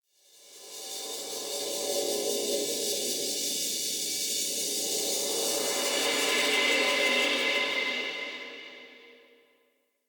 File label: Cymbal Swell; Ambience; Crypt; Champion Crypt